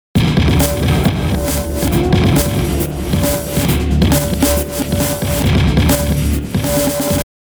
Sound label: reverse; sugar bytes; effectrix